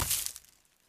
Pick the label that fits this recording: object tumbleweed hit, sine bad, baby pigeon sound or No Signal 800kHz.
object tumbleweed hit